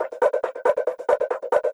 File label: 138BPM Loop; Drums & Perc; F major; Vengeance Samples; Another Day Kit